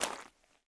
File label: zombie footstep mud; DeadFright